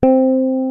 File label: BREATH; MORPHEUS